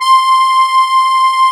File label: HOHNER, akkordeon